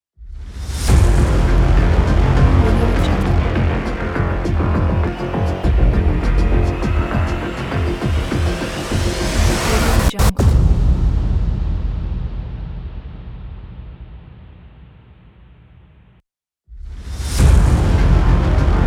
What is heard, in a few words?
action trailer logos